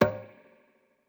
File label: conga; eddyflux